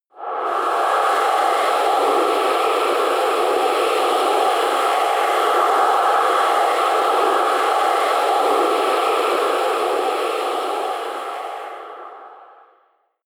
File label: air hiss scream, horror